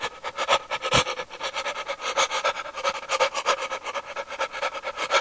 psycho alert; cof

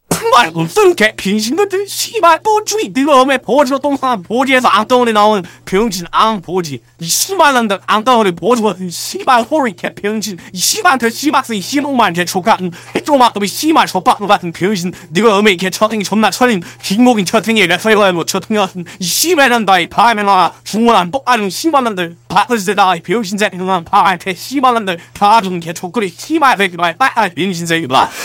positive vocalization; voice packs; scout

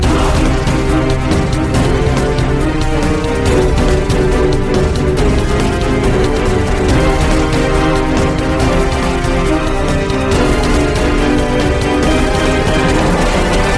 bomb ten sec count; music; sudden attack; tfgo

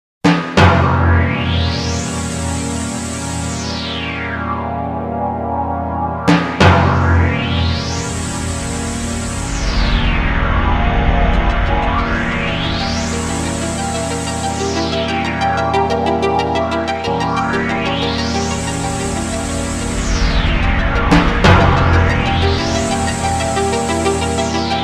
music start cave; rpg fortress

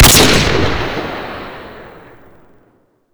awp shoot; bootysniper; ptsd; slender

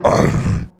attack, male zombie; nmrih; zerosiki; slender

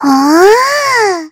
laugh evil; voice packs

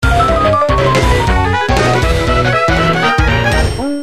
falling, music minigame; tf2ware ultimate

